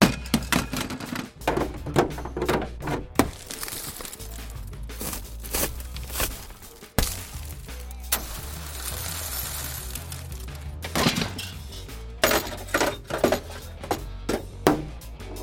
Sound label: Car Destruction